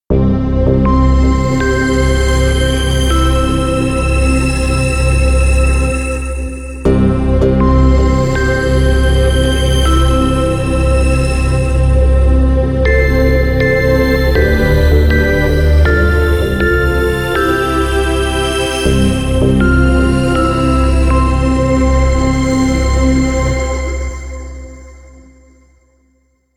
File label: Winter Music